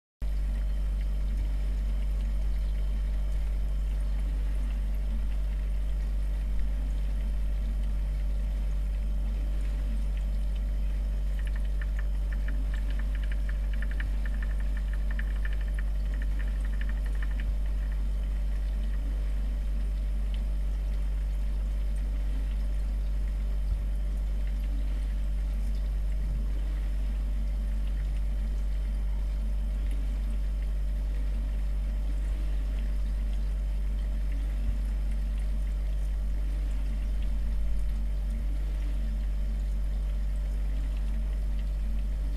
Fridge Droning SOUND EFFECT Refrigerator Kühlschrank Brummen